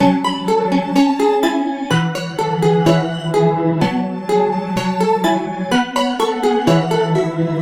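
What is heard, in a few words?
Ethnic Loop 126 Cavaquinho F minor; Ethnic Loop Vibes V2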